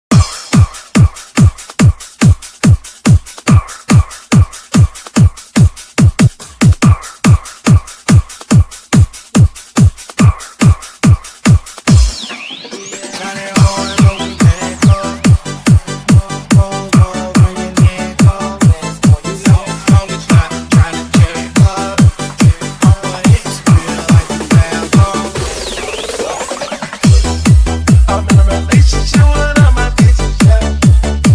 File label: dance musica remix